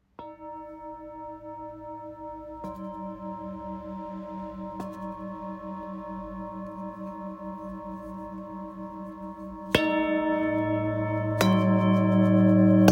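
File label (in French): Set 3 bols therapeutes, bols chantants tibétains; solaire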